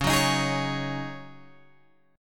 C sharp Suspended 2nd Suspended 4th, C sharp, Guitar, Open G